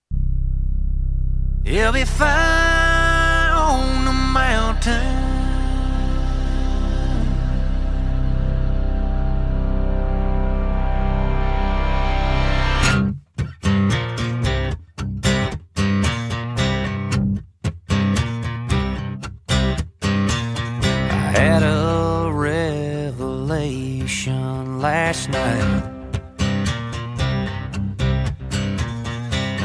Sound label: Country music; jesus